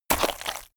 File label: mud water splash impact; berufe; soldat